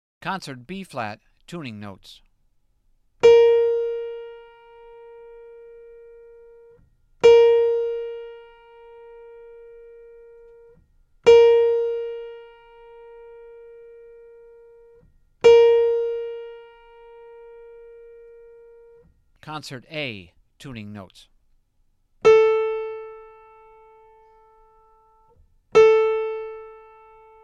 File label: Tuning Notes; James „Jamey“ Aebersold Play-Alongs